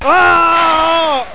"ohhhh"

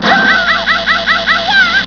yelp

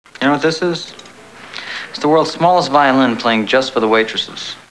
violin

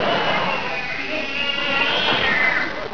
angry cat

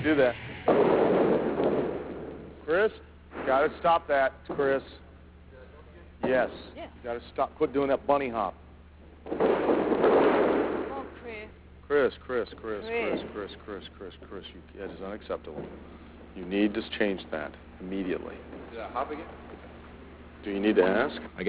tough sound

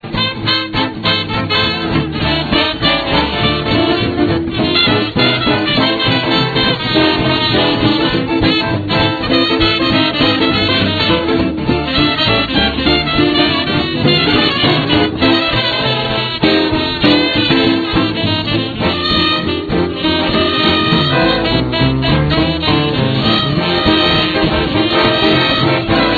swing a ling

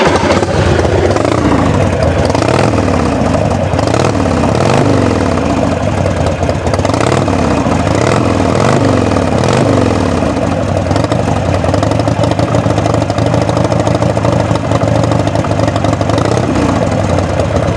RUMBLE

